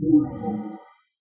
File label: menu down; Alpha Centauri DEMO